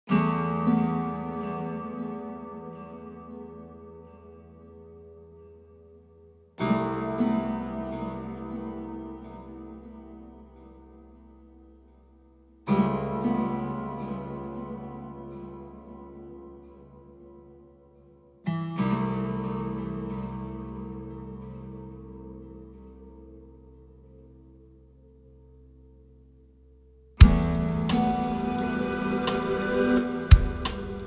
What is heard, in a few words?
Acoustic